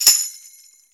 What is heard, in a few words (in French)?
TAMBOURIN